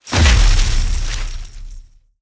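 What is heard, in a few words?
lock it suitcase; narcos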